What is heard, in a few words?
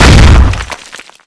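rocket explosion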